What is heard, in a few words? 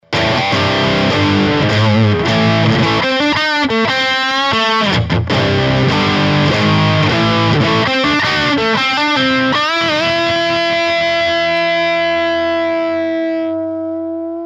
gain bridge no sustainer; fernandes retrorocket pro